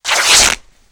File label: Cloth Rip; Home & Office; Sony Pictures Sound Effects Series Volumes 1-10; Sony Creative Software